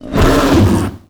CANNIBAL ATTACK

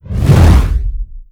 FLAME ARROW

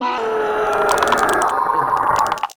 Die, Mob; Zetso

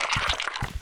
STINKBUG PEACE WALK

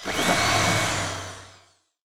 attack; ACCRETIA

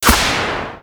AIMING SHOT

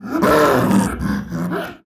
War beast war scream

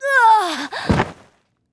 High elf Warlock die

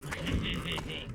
PEACE WALK, Mob

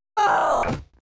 Spell Die

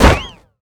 Gun Cloth, Damage

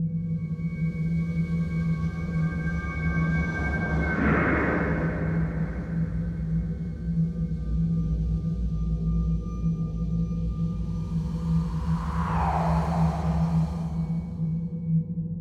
Magic, ambience

